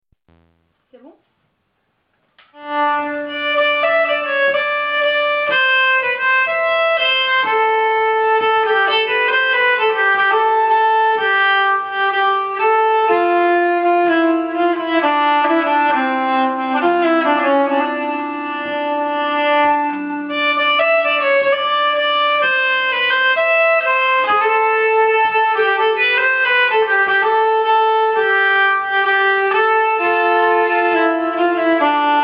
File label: menuett, nyckelharpa